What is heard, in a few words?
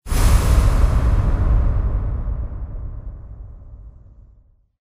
tone metal movement; video games; ambient; Source Engine; tf; lair